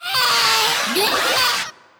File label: Kraken VO